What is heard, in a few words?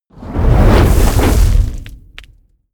whoosh fire sizzle medium